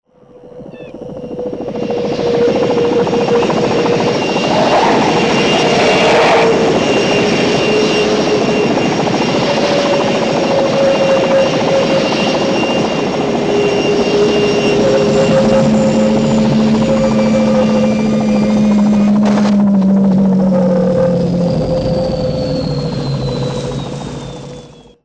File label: landing; Airwolf